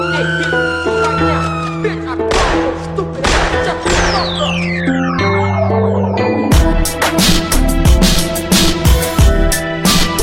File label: black beat, hip hop